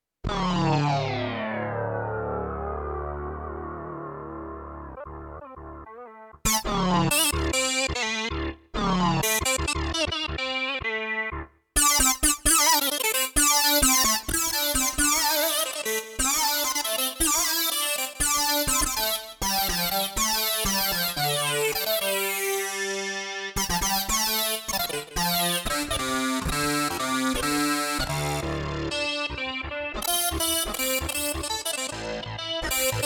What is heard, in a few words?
Sync solo